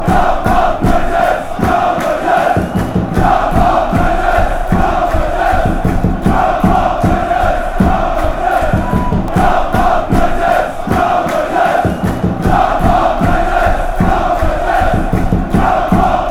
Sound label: come on brugges drums